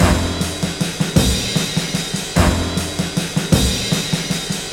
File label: 102 bpm drum loop sample f key, kick tuned to the F note